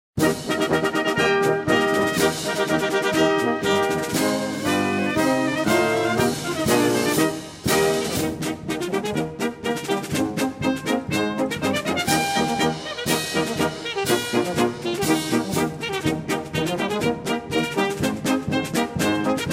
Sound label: Drum Bugle